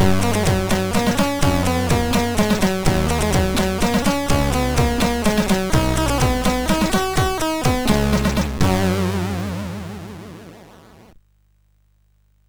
sid MOS6581R3; Scramble Spirits

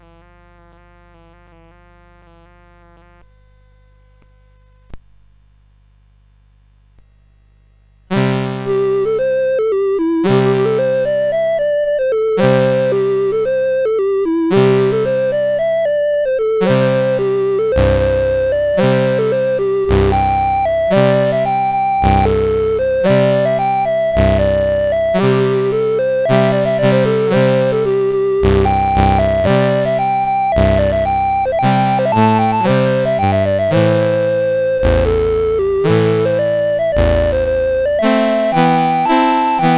CSG8580; Ta-gu; CSG8580R5

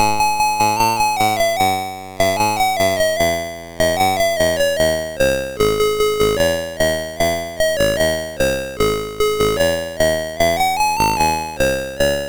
sid, MOS6581R3; Booga-Boo the Flea

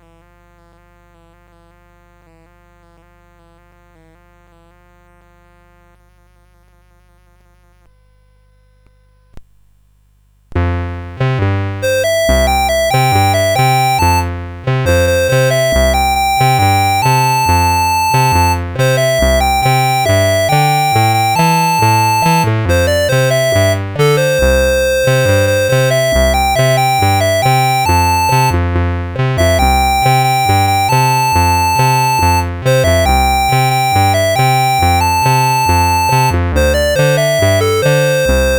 CSG8580; CSG8580R5

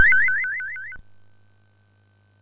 sid MOS6581R4

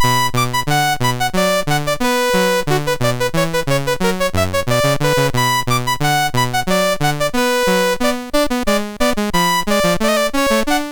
Orchestral Suite 2 Badinerie, MOS6581R3

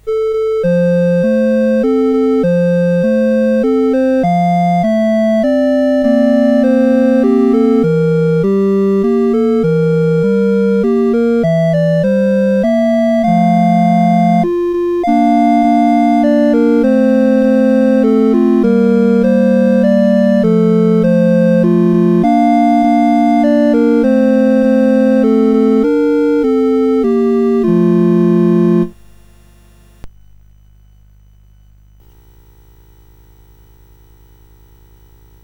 Lullaby, CSG8580R5

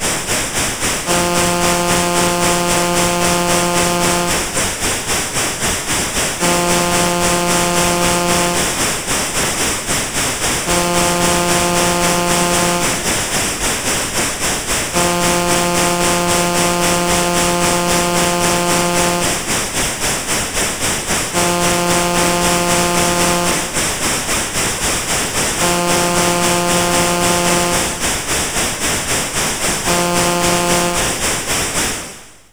Train with Whistle, MOS6581R4

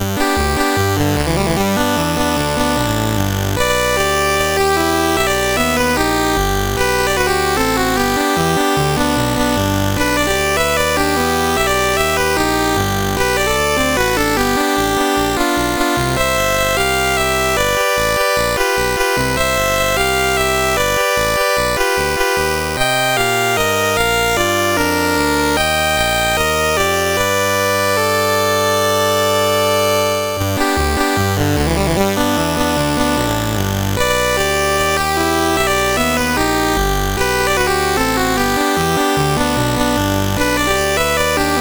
Country Boy, MOS6581R3

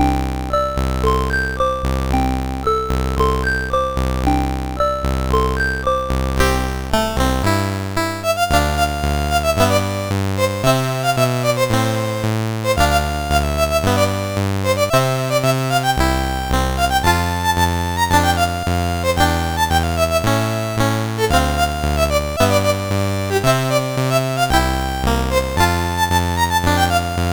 Christmas California, CSG8580R5

